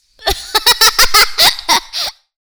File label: laugh